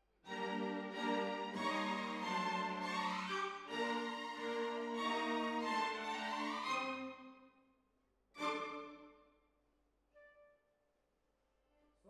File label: Hall, Jazz for piano